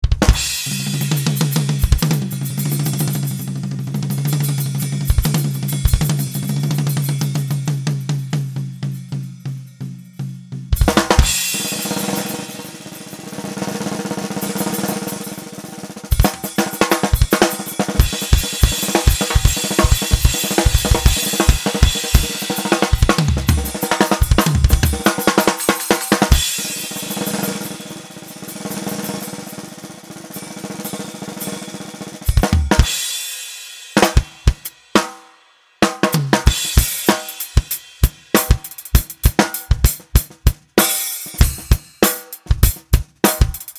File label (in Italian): BATTERIA; DEMO CORSO